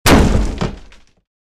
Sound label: Heavy Wood Door Impact With Some Debris Fall; Kicking